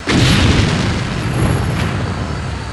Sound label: missile explosion; HEDZReloaded; abilities; simple missile ability and sound